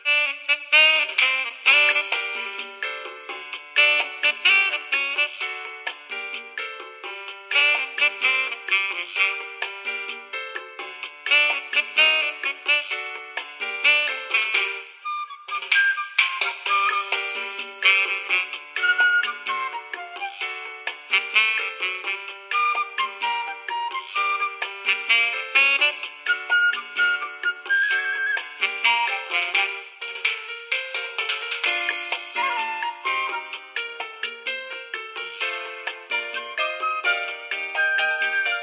Elevator

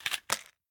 Match Box Drop; The Town

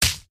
Game Punch

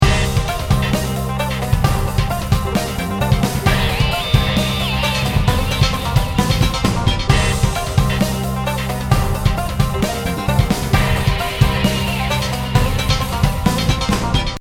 bgm serious; heartwarming store game mangobuku marche asobox; android game music

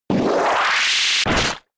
Horn Drill

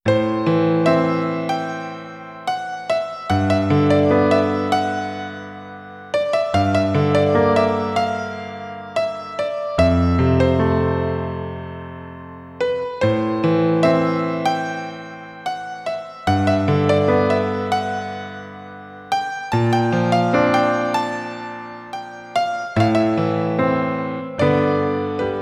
Piano arrange

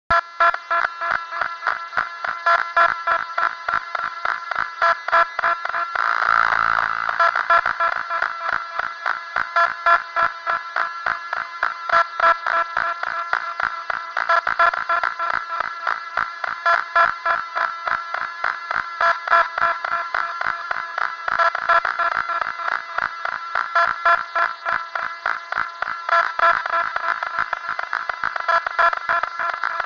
Radio

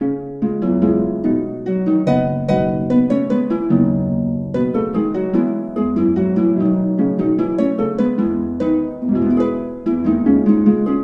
Harp; The Bard's Tale Remastered Trilogy

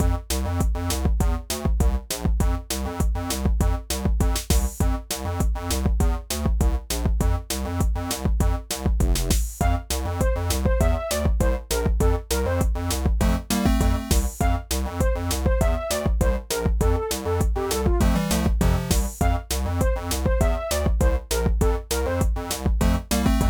Video Game Stage Karaoke; Pinky Street Kira Kira Music Hour Pop Princess; Video Game Stage 4

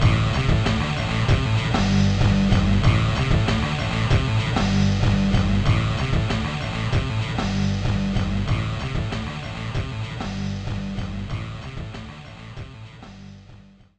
Anthem; ATV Wild Ride; NDS

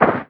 Ground short

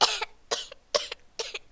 Boy Idle; In the Line of Duty Firefighter

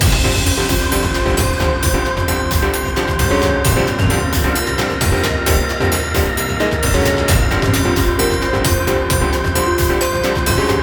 Battle Lp; RPG Battle5 A; Granblue Fantasy Versus

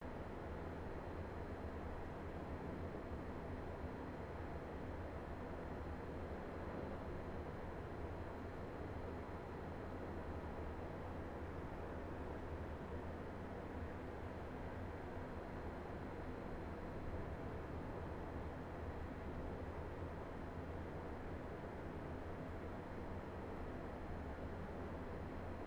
Hangar amb

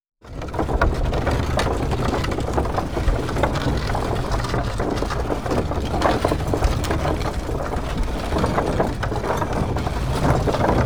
drawbridge lowered

